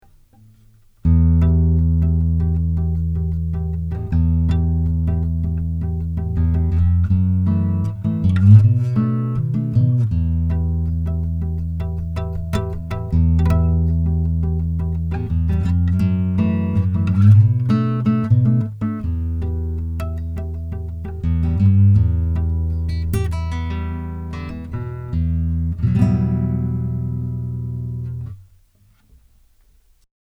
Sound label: Black Lion Audio Auteur Quad Nylonstring rb 100